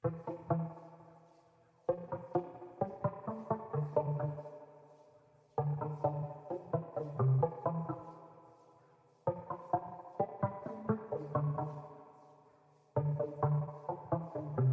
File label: 130 BPM A Min (OB6+P6) Guitar Pluck; Melodies; Loop Stems; Cymatics; Osiris